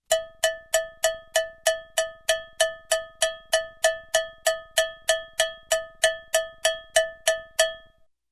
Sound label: Simple Cowbell Rhythm